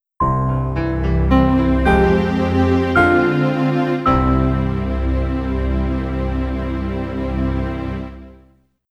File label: Sting